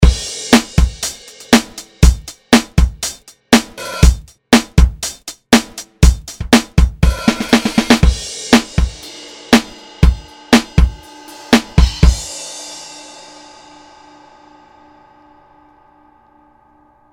Vinyl Funky; pettinhouse

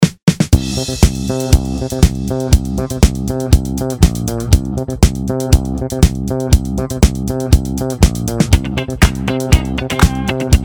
Disco fever

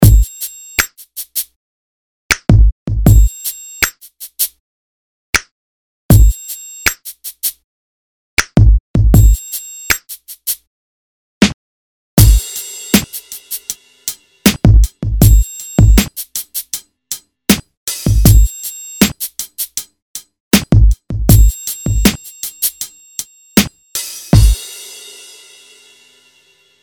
RnB

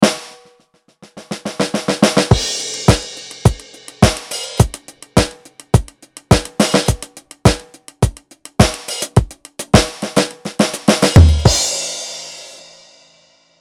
Jet Kit

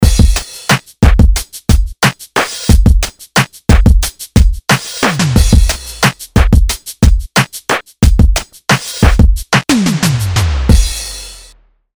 Hip Hop Retro

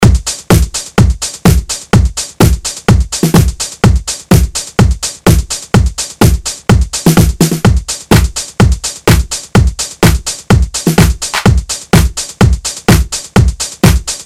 Funky House

